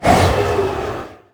Whoosh Small Evil; god awe full clicker 2018 gamerip

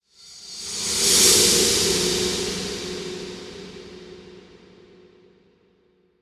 Interface Cue; The Suffering

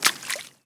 run water

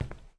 SFX Footstep Wood

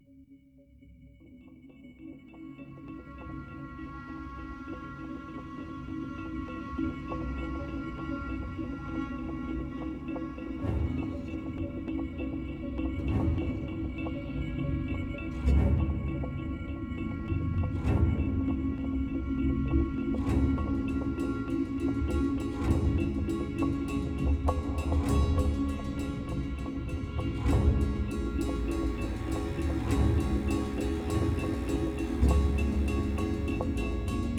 Default Loading Music; Battlefield 1

